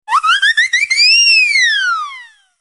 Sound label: False Alarm